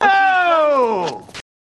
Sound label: oooooooh